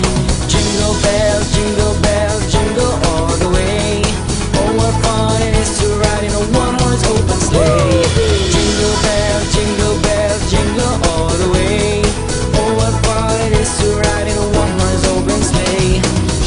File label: Jingle Bells